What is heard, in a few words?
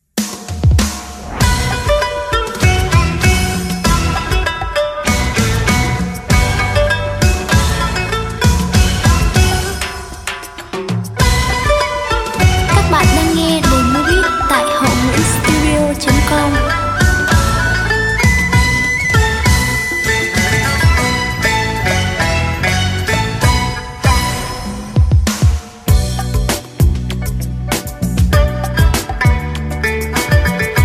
beat, playback